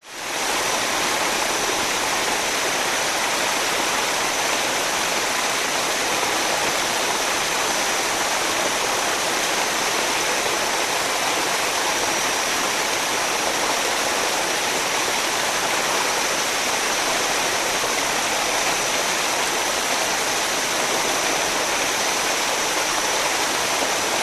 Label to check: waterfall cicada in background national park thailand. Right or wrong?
Right